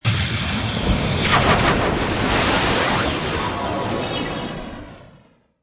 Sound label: Magical Storm Blasts Whooshes: Magic sound of casting spell; Magic sound of casting spell, conjuring or wizardry. Witchcraft ritual summoning elemental or